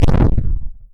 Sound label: Retro Boss Step